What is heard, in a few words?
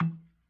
B3 PERC, Vintage Keyboards